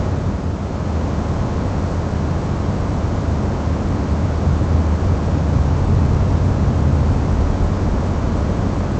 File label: wind